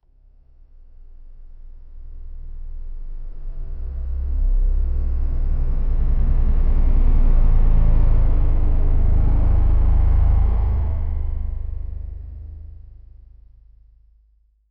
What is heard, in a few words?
cinematic slow mo sound, aeronautics